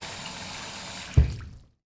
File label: Faucet Turn Off Water; household